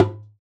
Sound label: percussion